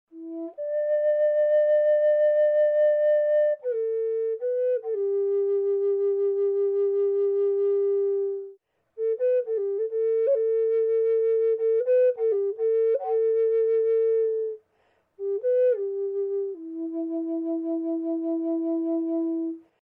Low E Sassafras, flutes

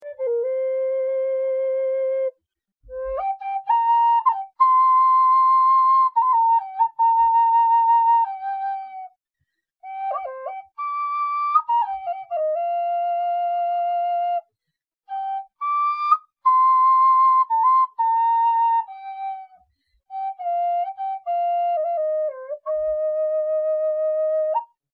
Medium C Birch, flutes

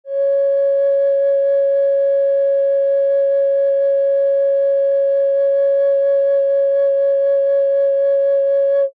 Medium C Sharp, Flutes Single Note

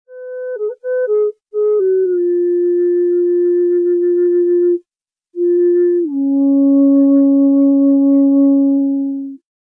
Bass C sharp Maple, flutes

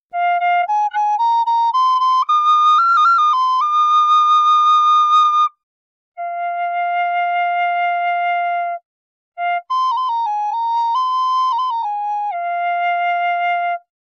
high F figured maple; Flutes

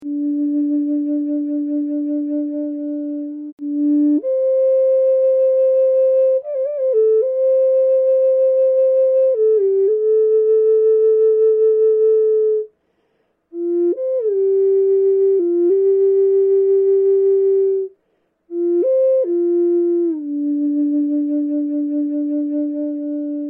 Bass D Walnut, flutes

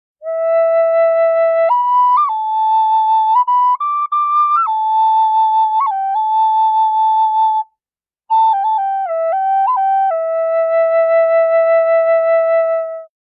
Small E Western Cedar, flutes

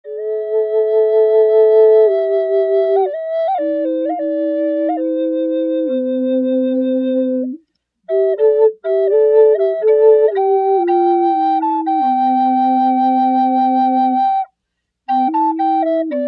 Duet Bass C Medium A, flutes

